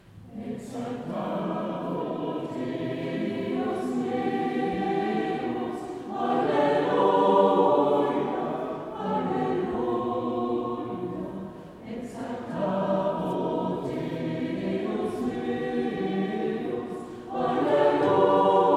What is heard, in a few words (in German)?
Zwischengesang Halleluja, Chor; Allerheiligen 2024